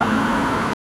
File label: a dynamic cinematic shot, motion blur